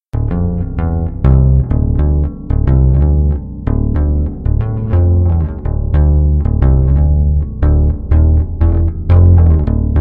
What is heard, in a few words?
bass sound sample neck, bass guitar; sanjuro